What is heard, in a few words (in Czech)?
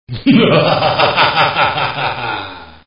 Muzsky Smich